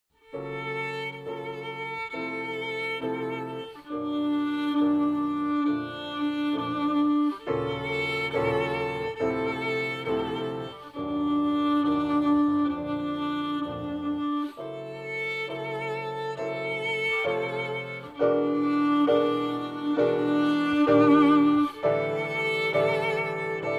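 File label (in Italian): Tre pezzi, violin e pianoforte; Tre pezzi x vl. e pf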